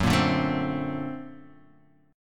Bb Augmented Major 9th Gb

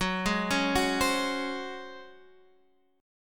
Db Major 7th Suspended 4th Gb

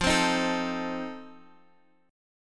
C Minor 6th, F, chord; Cm6/F Chord {X X 3 5 4 5} strummed

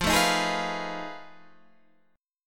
F 7th Sharp 9th Flat 5th F

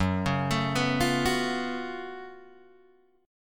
Gb Minor Major 11th Gb down Guitar Open G

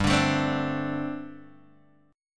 G Suspended 2nd Suspended 4th, Guitar Open G

